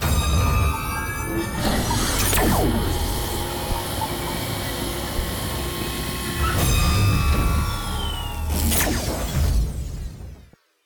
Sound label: decontamination; CosmicRage